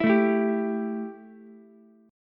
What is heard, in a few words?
E Minor Double Flat 5th, Ukulele; strummed; 9,9,12,10; JGuitar